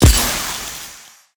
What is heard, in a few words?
atk spray gun